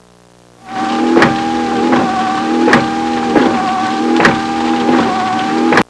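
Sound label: laboring, car; trasporti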